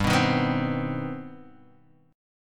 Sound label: C Minor Major 7th, G, chord